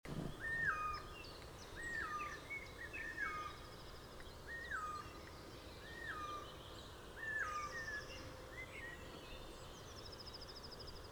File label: Garrulus glandarius; SETE